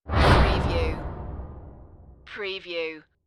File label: Wipe